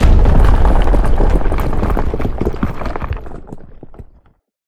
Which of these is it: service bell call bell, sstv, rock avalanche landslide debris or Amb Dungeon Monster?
rock avalanche landslide debris